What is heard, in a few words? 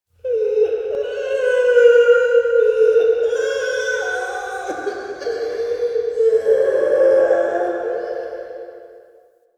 Dungeon Island Misc Children Ambience; Warframe; Duviri